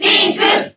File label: Crowd JP; Toon Link